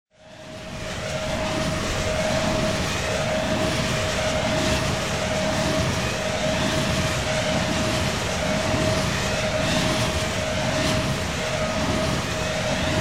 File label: turbine noise